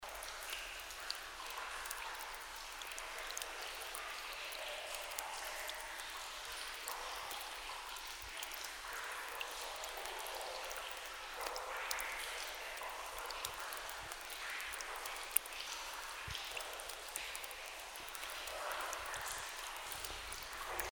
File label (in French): echos gouttes